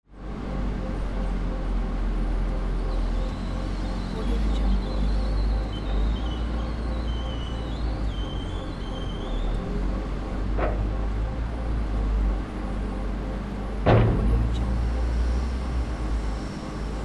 bulldozer working behind the factory wall

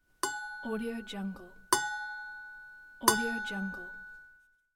forge iron; cinemandre

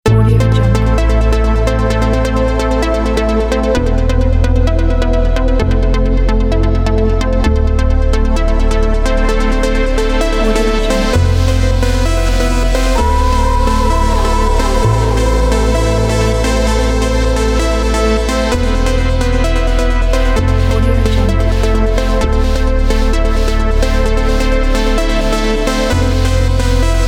deep trance; transphere

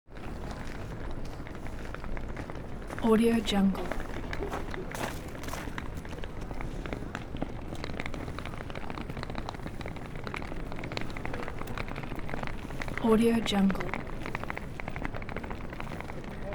atmo urban city winter trolley case

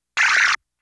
synth zap high pitch video breakup glitch

